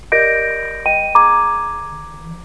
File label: DSB ding dong; marklin